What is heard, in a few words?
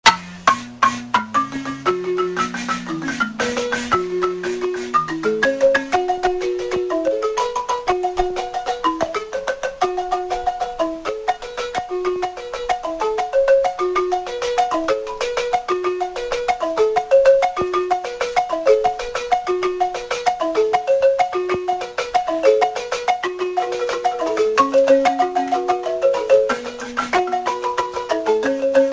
balafon